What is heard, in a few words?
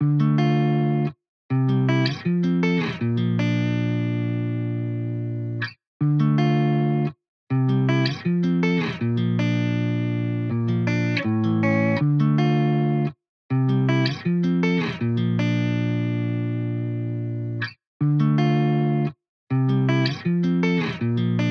80 BPM D Min Main Guitar; Loop Stems; Cymatics; Miami Guitar Pack - Pt 1